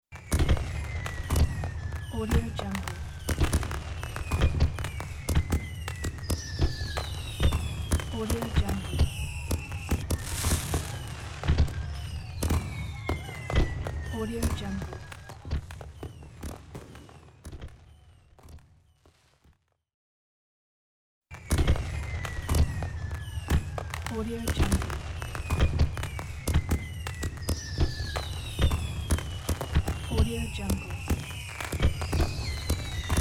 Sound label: fireworks